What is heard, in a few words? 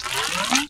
spinal zip, zaps and beeps